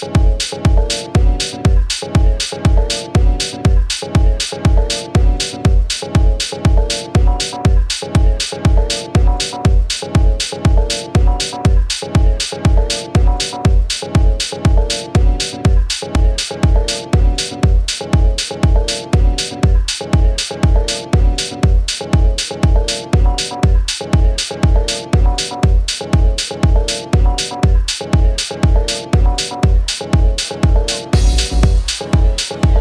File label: synth